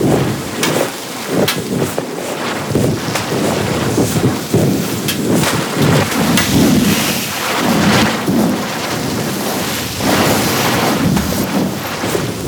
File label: Fire Loop; Warframe; Tau; Ambience